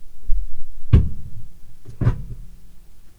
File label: close left emergency access door, Aircraft A320